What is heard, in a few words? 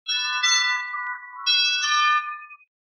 Loop Bells